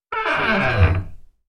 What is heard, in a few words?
Creaky Door